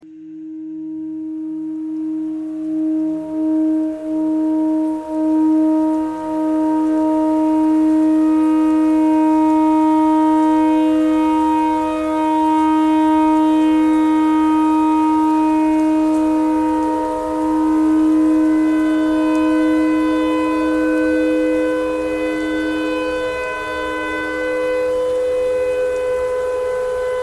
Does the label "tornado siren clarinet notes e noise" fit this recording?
Yes